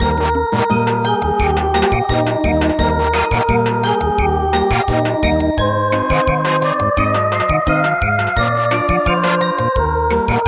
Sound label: Samba